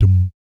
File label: BS DMM, Vocal Planet Jazz & FX; ILIO; BASS DUMMS